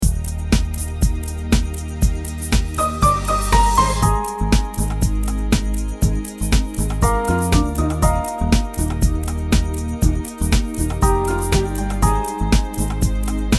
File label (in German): Warteschleifenmusik; WM-GROUP